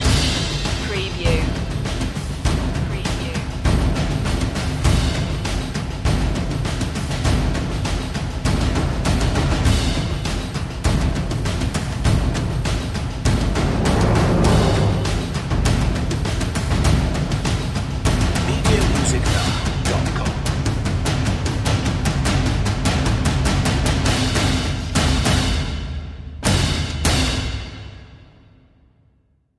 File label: perc mix